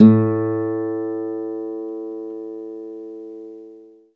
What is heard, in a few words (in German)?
KONZERTGIT, Alpine Volksmusik